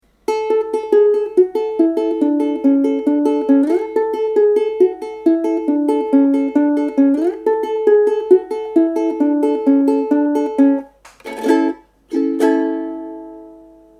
Wedding Bells, ukulele

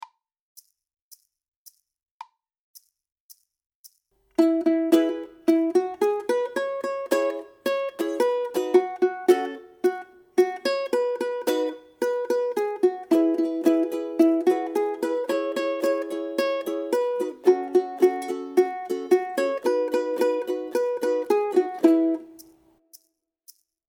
Intro Two Ukes

